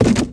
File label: bouncy pizza box hit; bouncy objects; Tony Hawk's Project 8; XBOX